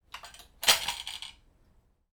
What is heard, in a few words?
crossbow set down slide on linoleum floor